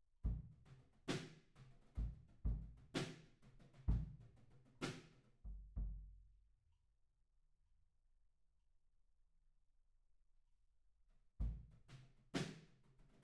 Room

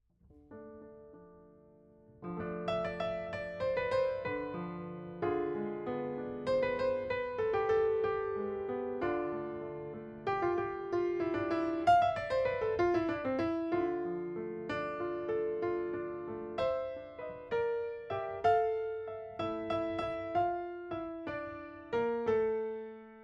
Piano B

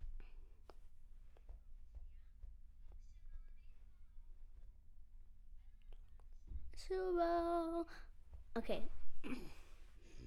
Children Choir; Studio Bekol Children Choir

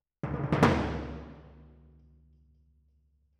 Timp Main

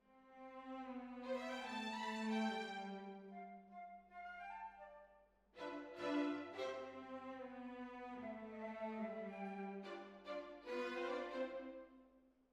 Hall, Jazz for piano

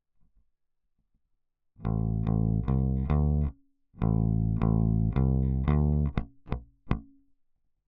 Bass

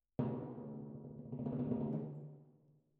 Timp Main

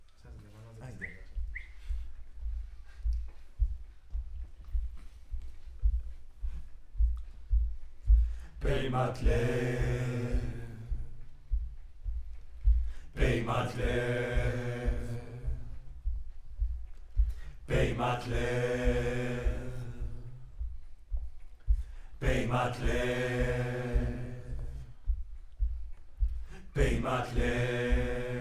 Choir; Decca LR

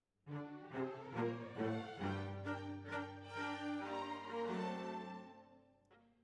Cellos

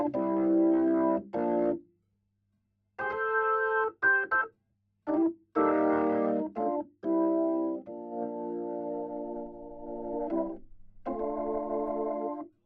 HAMMOND

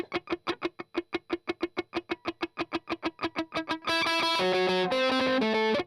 Strum Octav

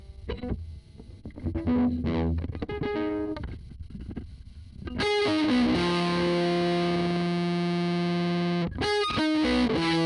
Guitar

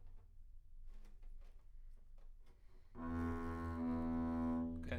Bass Room

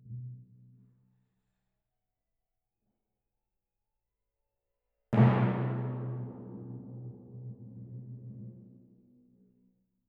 Timp

